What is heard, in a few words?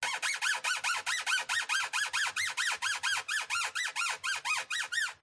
Wood Stress Creak Squeaks; DPA4060; pmsfx